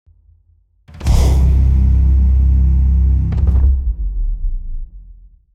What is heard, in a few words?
bigwin end addon